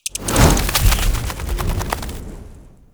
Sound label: Infested K Drive Boost Fast, Vehicles